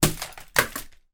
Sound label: toy pushchair drop on floor